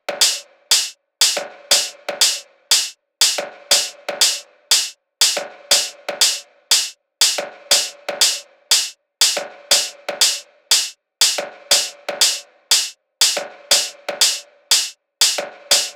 hats 120, Hat Loops; drum sample pack; SEISMIC; Slate

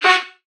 vocal rapid F#; SD AURORA Sample Pack; Slate

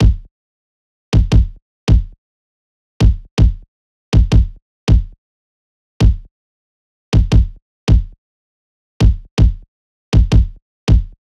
kick loop 80; Pop; Drums; Loops; Slate; SD PASADENA Pop Sample Pack

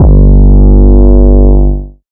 808 Bass Mids G3; Slate 808 Deep Dive; SD Signature 808s

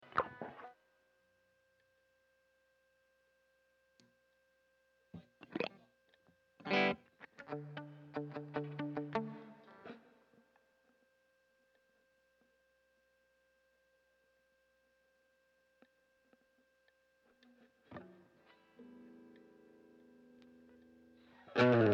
Strings Mix

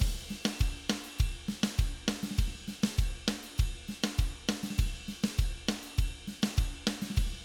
Cumbia Chorus

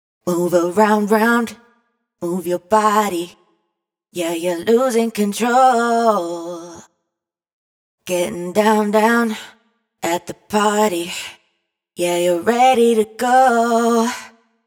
hook Losing Control 123 Dm wet, Vocal; Slate Digital Omnivox Vocal Sample Pack

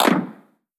Perc FX Stumble, 909 FX; Slate; Vintage Drum Machines Volume 3 909 Edition

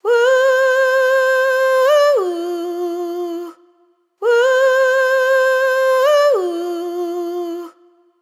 riff 115 F wet, vocal; Slate Digital Omnivox Vocal Sample Pack

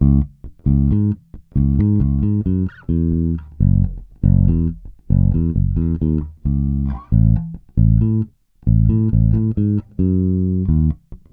Bass loop 135 Dbm, Electric Bass Loops Direct; Slate Digital BASSHOLE Sample Pack

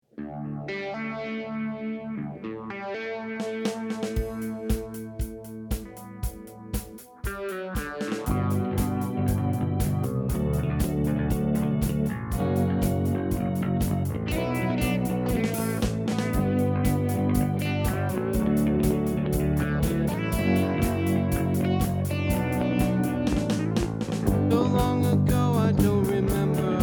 vocals

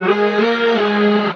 vocal aloft Ab; Slate; AURORA Sample Pack; Vocals

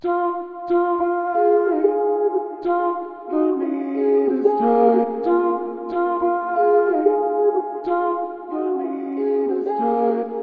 Vocal chop, 92 Bbm; Slate Digital Omnivox Vocal Sample Pack; Chops

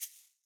shaker single hit; Slate; SEISMIC Drum Sample Pack

